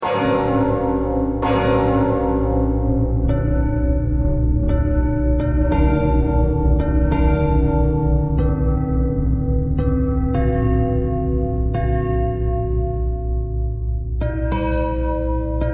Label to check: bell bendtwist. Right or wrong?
Right